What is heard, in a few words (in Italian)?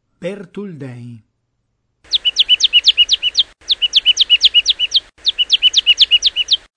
Cincia bigia